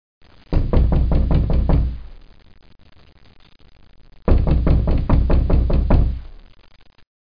Knocking; Broderbund Print Shop Multimedia Organizer Deluxe Edition

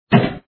FLIP1; pin ball deluxe

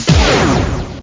TEK BLAST; Groovy Bytes: Behind the Moon; BAS PERC

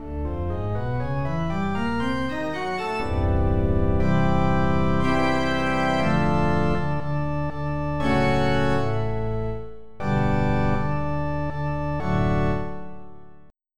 Church Org; EverQuest

